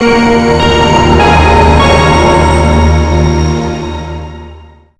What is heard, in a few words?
lose; PSO音源